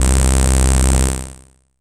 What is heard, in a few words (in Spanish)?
onda sierra